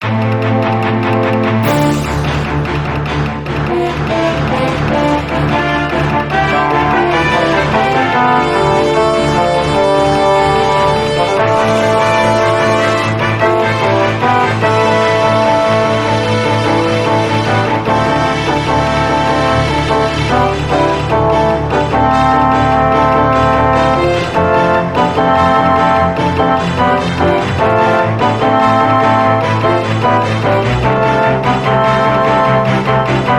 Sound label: Bgm Fantasy